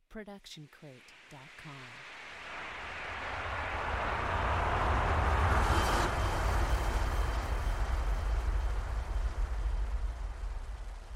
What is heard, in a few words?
Noise Lifter; soundscrate